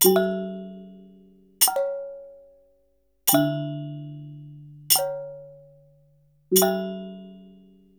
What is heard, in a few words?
WYANG L, Heart of Asia